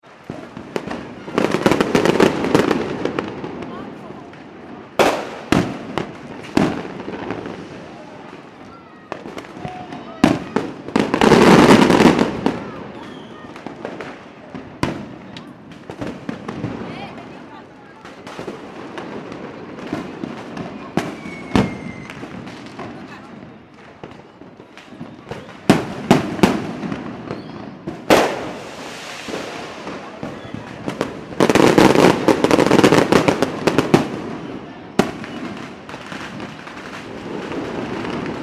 fireworks mixture urban, Fireworks (Large And Small) In City/Town; Fireworks In City / Town - Mixture Of Large And Small Fireworks Close By And In Distance; Chinese New Year; McKinney Sound